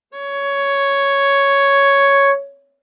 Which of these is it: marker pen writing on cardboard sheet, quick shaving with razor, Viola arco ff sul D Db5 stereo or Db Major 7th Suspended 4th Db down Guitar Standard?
Viola arco ff sul D Db5 stereo